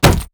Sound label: Click